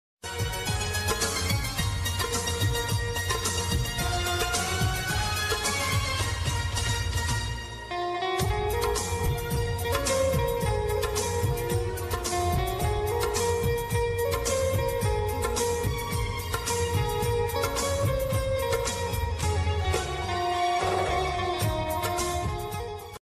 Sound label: love romantic ringtones love bgm ringtones hindi ring tones south love bgm